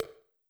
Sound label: Tek Hit A, Old Skool House, 137bpm; Belfast